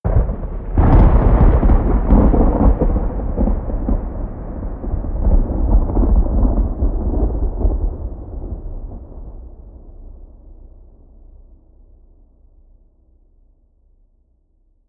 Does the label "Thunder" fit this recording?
Yes